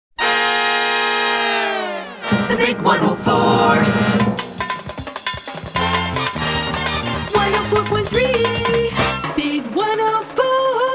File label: Jingle; Short Attention Jingle Show IV